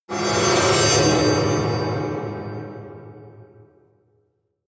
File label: whoosh metallic ring